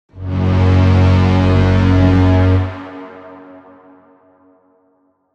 Cinematic Sound Braaam Sample Brass Trailer; Chakong Audio

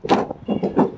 Jumping On Car Hood

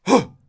Fight Shout Man Hai

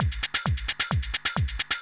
Tech loop

